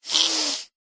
human male inhale nasal hard forced slightly blocked; zapsplat